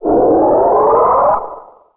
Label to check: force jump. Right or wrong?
Right